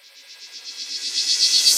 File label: Reverse Fx; musicradar